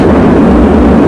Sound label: jetpack